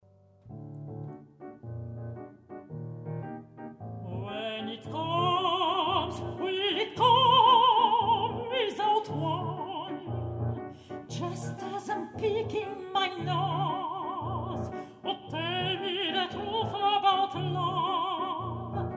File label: Cabaret songs, Mezzo, Piano